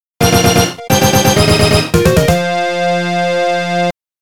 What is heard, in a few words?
Pattern Clear; Contra Chronicle Vol.2 The Beginning of the Legends